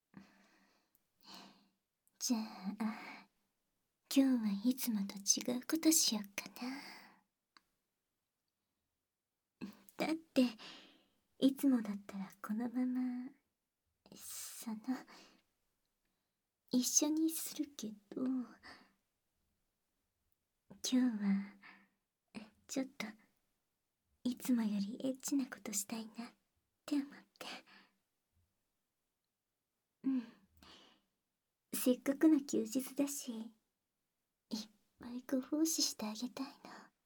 耳舐め

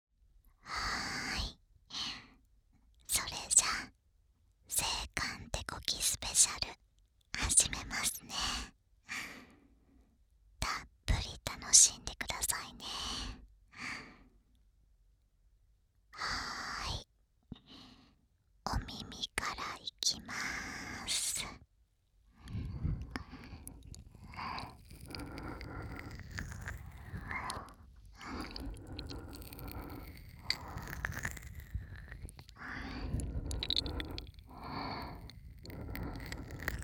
極・耳舐め手コキ(左耳用), ASMR

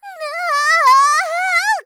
んなああああ！, ショタ

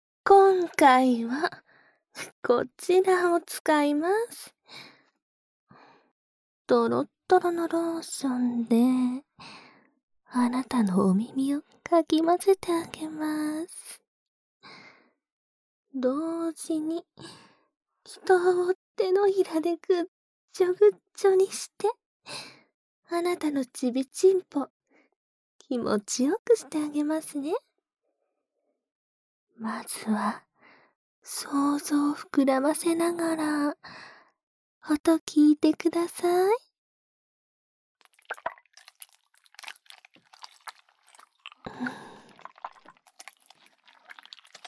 ローション耳責め＋連続寸止め亀頭責め, ASMR

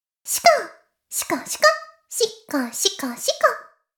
しこしこ音声; おにいちゃんアイスぅー！; 妹

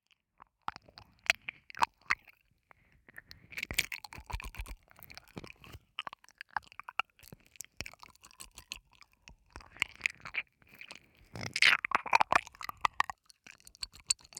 耳舐め 右

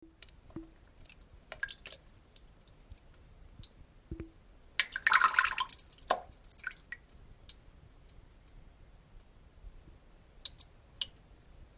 ちょろっとおしっこうんこも一緒に

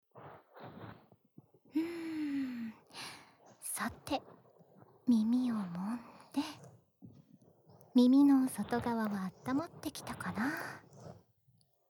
至福の耳ふー, ASMR; 暖炉なしver

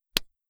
ぱちん

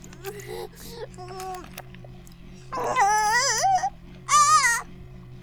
バイブ放置, ASMR

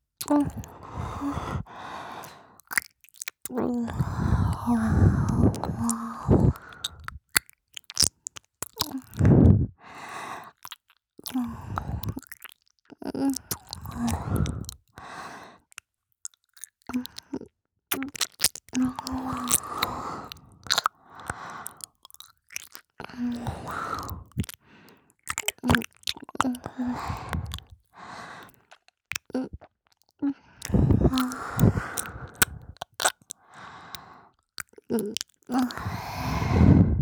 ぬろぉ～っ…くぷぷ…, ASMR 左耳

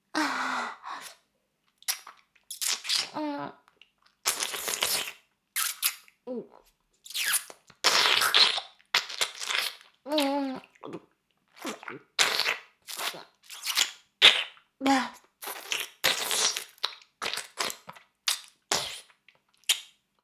耳舐め音声(LR逆ver); 少尉; 牧場戦腺

